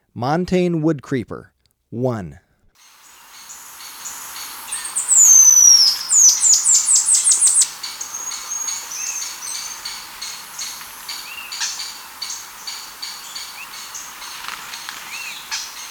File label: Montane Woodcreeper, Birds of Ecuador; S. America